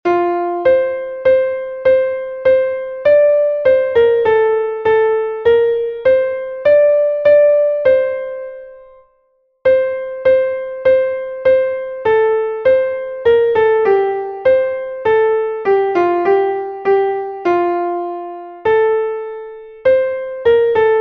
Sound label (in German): klavier; MelodieMeister